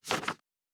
Book Page; Fantasy Sounds Bundle

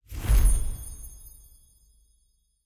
Positive Effect; Fantasy Interface; Fantasy Sounds Bundle

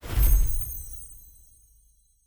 Positive Effect; Fantasy Interface; Fantasy Sounds Bundle

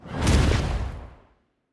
Fire Spell, Magic Sounds; Fantasy Sounds Bundle

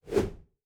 Whoosh; Fantasy Sounds Bundle

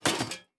Armour UI; Fantasy Interface; Fantasy Sounds Bundle

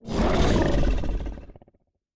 Growl, Monsters; Fantasy Sounds Bundle

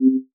Interaction Basic Ray Back; Oculus

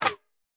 Weapon Draw Wood; Fantasy Sounds Bundle; Medieval Combat Sounds

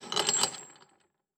Chain; Fantasy Sounds Bundle; Craft

